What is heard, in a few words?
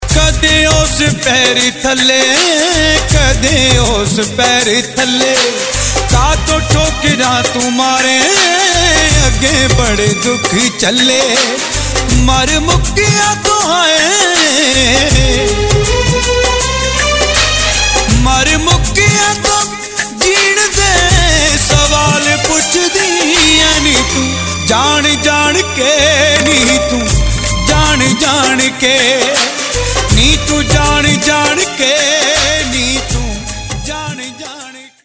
Sad Ringtones, Punjabi